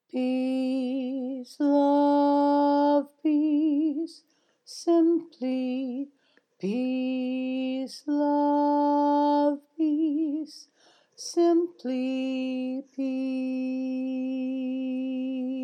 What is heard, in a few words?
melody